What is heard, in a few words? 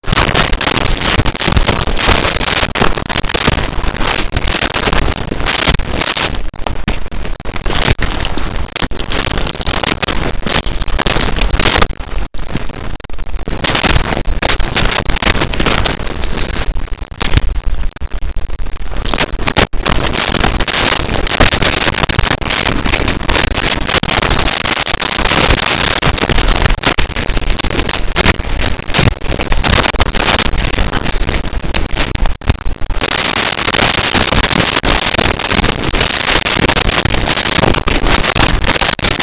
Footsteps Multiple Ice; Impacts; SONY PICTURES SOUND EFFECTS SERIES